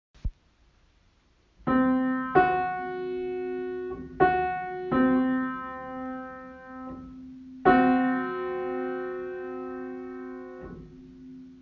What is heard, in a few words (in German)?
Tritonus